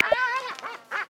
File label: Waved Albatross; Xiaomi Redmi-8A